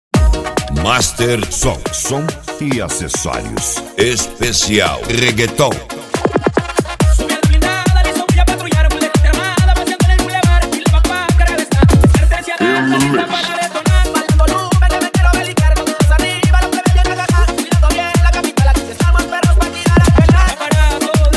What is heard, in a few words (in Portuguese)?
reggaeton